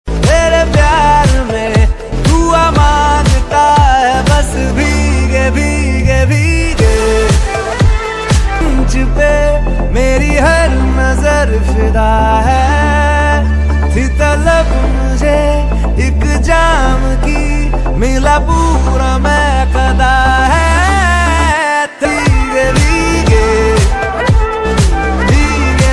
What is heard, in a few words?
Hindi ringtone